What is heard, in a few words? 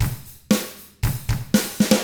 ROCK